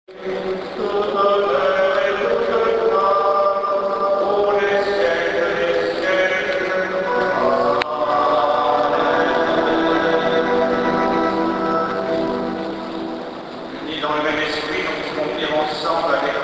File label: singer